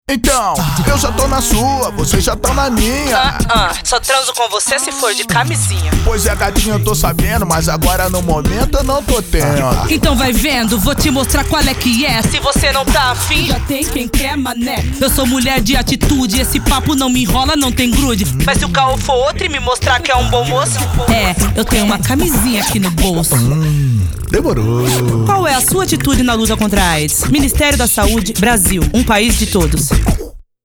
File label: jingle; campanhas 2007